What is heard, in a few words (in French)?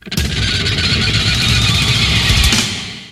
VIRGULE Metal; habillage